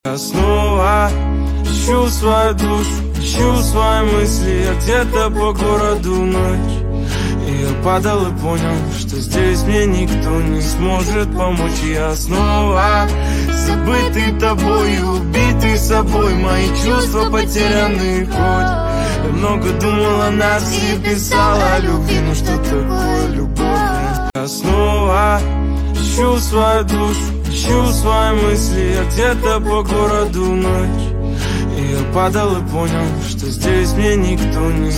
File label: acoustic